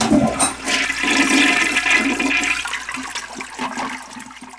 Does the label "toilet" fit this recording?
Yes